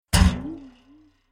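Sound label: sounds of canister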